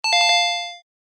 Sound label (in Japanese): ピンポン 二連続